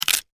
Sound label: Horror Bone Break Snap Crunch; Injury Sound Effects; Injuries (Sound Effects:Combat)